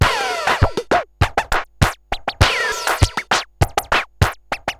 Moog Freqbox RX17 (100BPM), cyborg beats

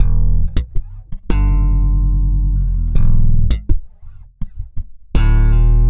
Slap, Bass; Bass X

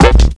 PERC, Drum & Bass

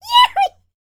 shouts; World Voices; YODEL YELL; ILIO; Vocal Planet

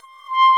C4 METAL SY, XIANG REEDS; NorthStar - The Wizard VOL-2

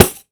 14 PANDE, PANDEIRO

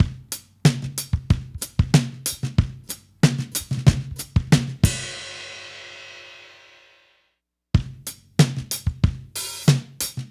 drums break, analog funk; Sonic Foundry; What it Is! 70s Analog Funk

